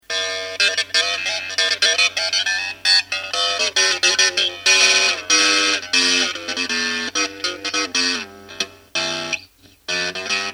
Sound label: maestro ring mod